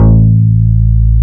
XFM BS, FM Syn Bass, Synth Bass

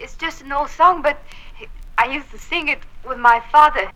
VOX GENERAL; AMG Megabass REMIX! VOL-2

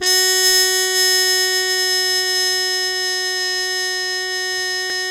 MUTE F#1, Mood Trumpet; E-MU Formula 4000 Series Vol. 1; Hip Hop Nation

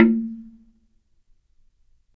HRD SLIT DR, Heart of Africa; SLIT DRM2

